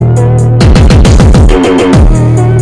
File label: rage tone